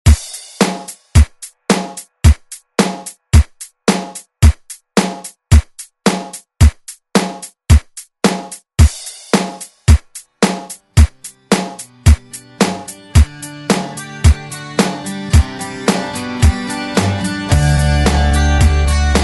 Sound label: 70s ReDrum; DJ Mhark